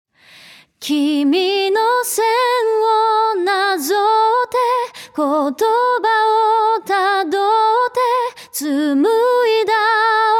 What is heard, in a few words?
ballade